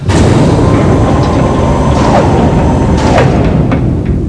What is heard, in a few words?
airlock